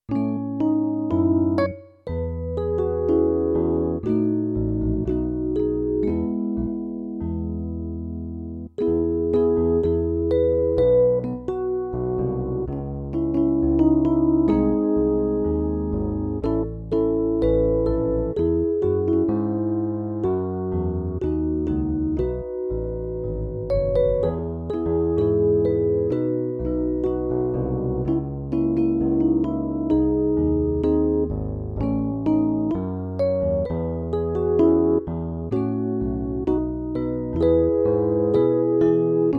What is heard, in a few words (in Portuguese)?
playback hino